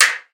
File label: armor